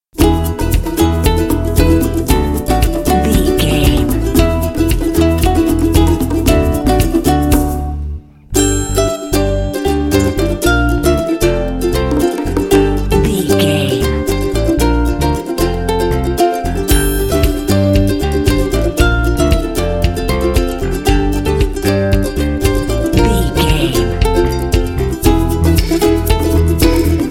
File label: happy ukulele